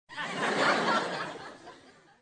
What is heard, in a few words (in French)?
rire sitcom